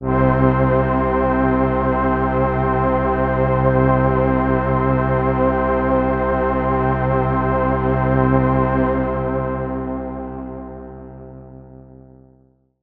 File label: pad C2, Oberheim OB-Xa